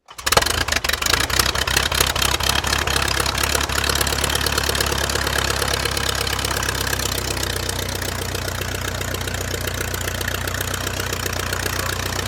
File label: AIRCRAFT DH82A DEHAVILAND TIGERMOTH STARTUP TAXI; 1936; Airplanes; Vehicles